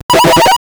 8 bit sound for start; interactive

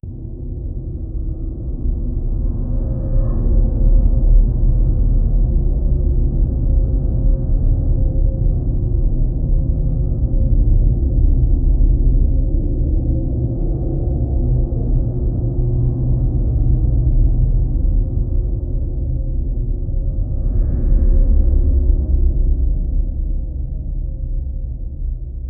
creepy ambient soundscape, tunnel

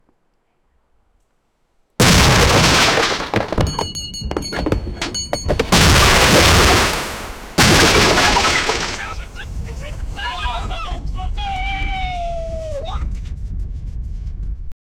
titanic buckling and expl; bending

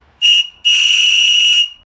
steam locomotive whistle

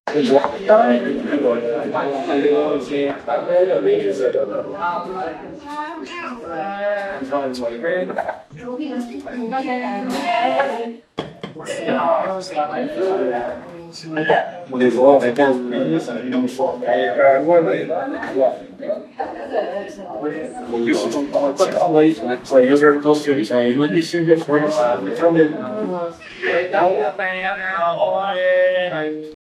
formal dinner in townhous, dinner

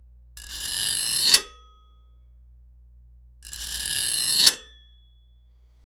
an old rusty metal lever; industrial

